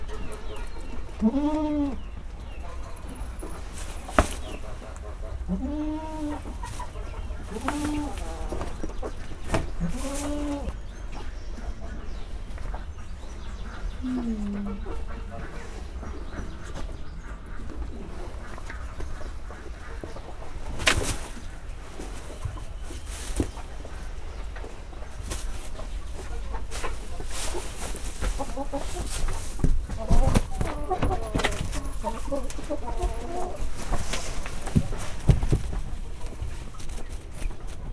szalmában ugrál más állatok, házinyúl; állathangok; paraszt udvar

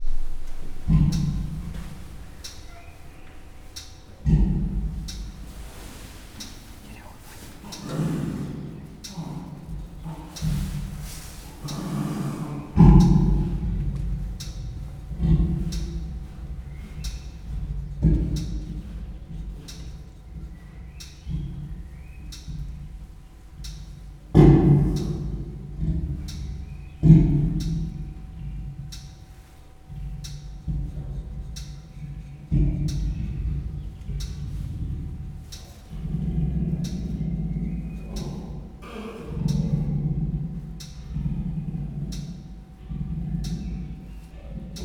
villanypásztor szélvédőszivacs nélkül, perzsa leopárd; pecsi zoo 2017

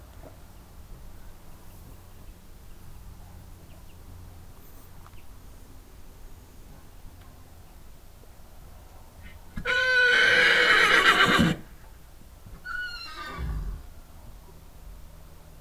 egymas kozotti parbeszed kutya madarak, lo; állathangok; paraszt udvar

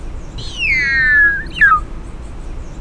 kígyászölyv; szegedi zoo 2011

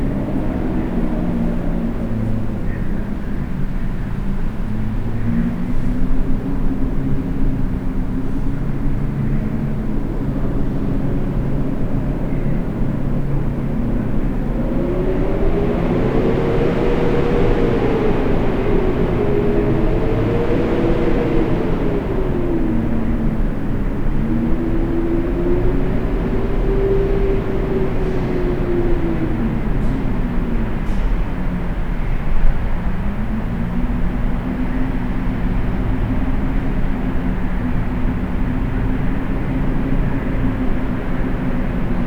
ajto mogul, szel; angyalfold

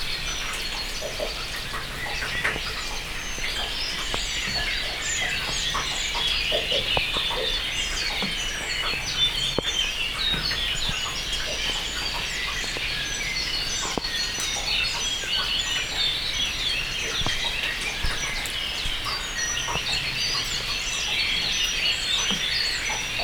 teraszalol kopogo eso; vízház; cardioid

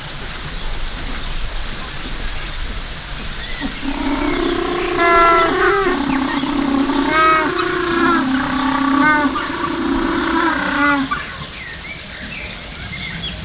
pingvinek röviden, környezet hangjai, pápaszemes pingvin; jaszberenyi zoo 2015

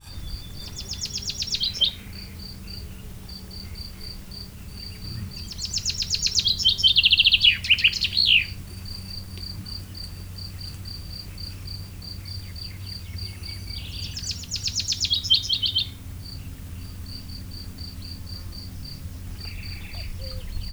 tücsökkel, erdei pinty; termeszetben

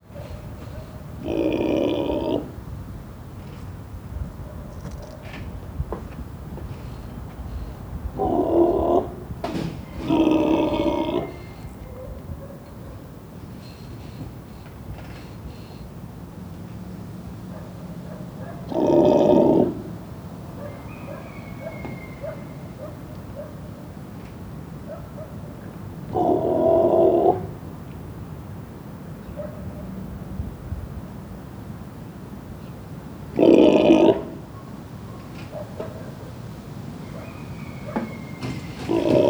takin, szélvédő; állathangok; nyíregyházi zoo 2010

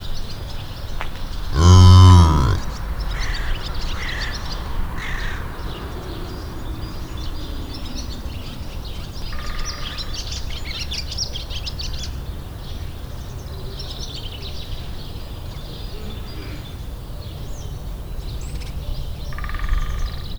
harkaly is, kardszarvu antilop; debreceni zoo 2012; marantz puska

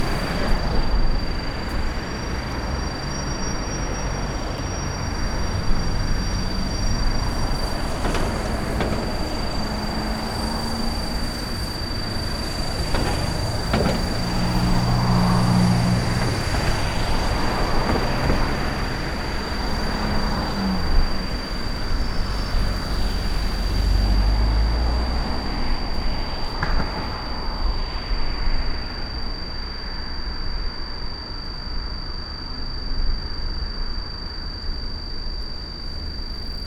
ciripelo jelzolampa

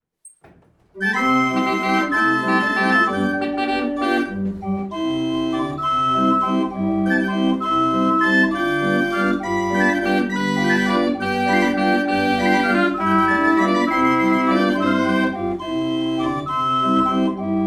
kintorna hengeres hang dallamu; verkli hang muzeum; 30 hang 8 dallamu; 1930 nemetorszag

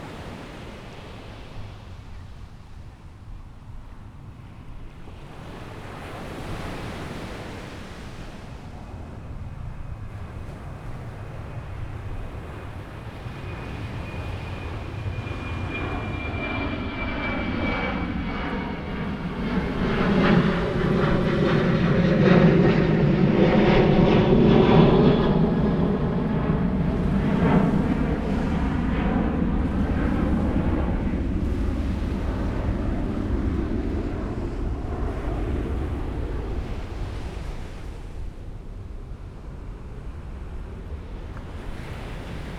homokos part felett felszallo repulo

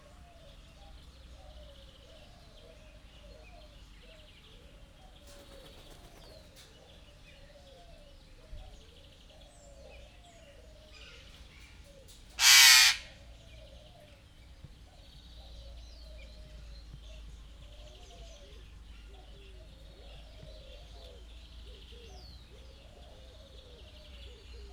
egyet rikolt, zöld szárnyú ara; jaszberenyi zoo 2015

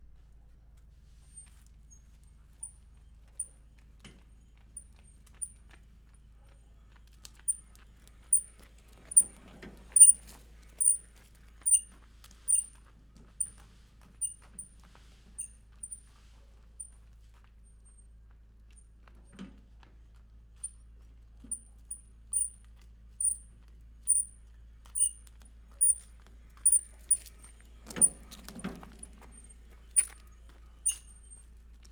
üresjárat négyszer, talicska; varboc

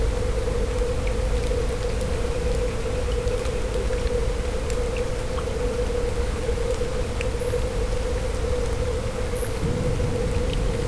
nagyon távol kuruttyolnak vízház, varangyos béka; allathangok; termeszetben; cardioid

